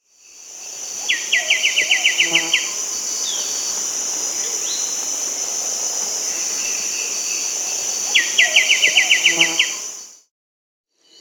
White-winged Becard (Pachyramphus polychopterus), Peru; S. America